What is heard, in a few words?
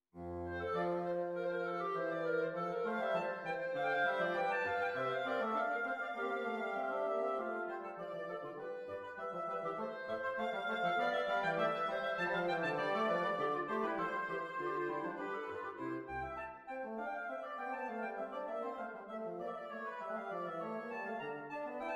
Sinfonia No. 8 in F Major; BWV 794